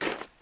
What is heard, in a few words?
snow walk, step; blocks; Minecraft; Remastered